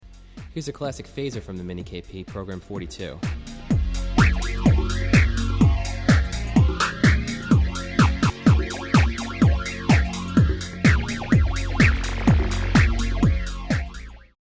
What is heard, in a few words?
Phaser